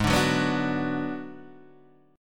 G sharp Minor 7th Flat 5th G sharp down Guitar DADGAD